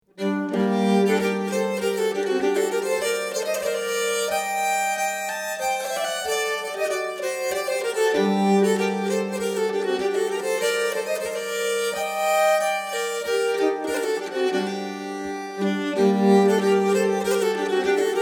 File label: Polska, nyckelharpa